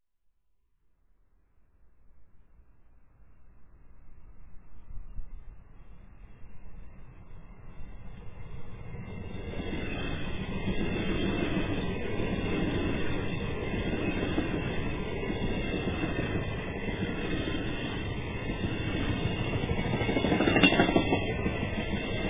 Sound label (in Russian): 3D Poezd; 3D звук , слушать только в наушниках (иначе эффекта ни будет ) , желательно в компьютерных